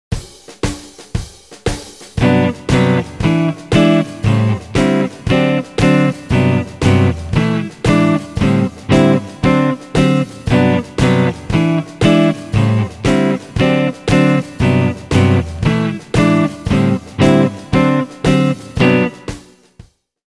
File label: Rhythm Guitar